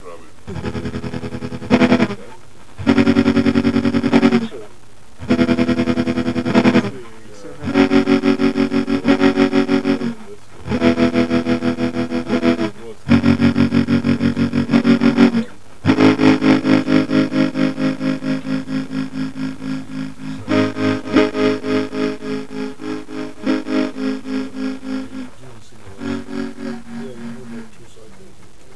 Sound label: Tremolo